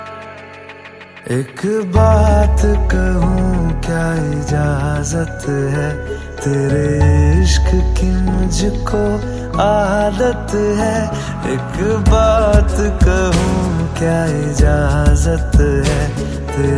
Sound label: Romantic Song Ringtone